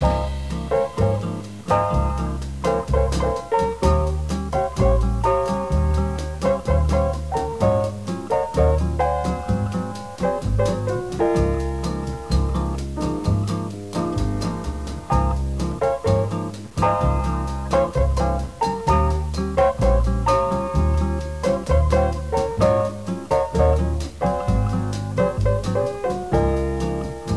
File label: bar music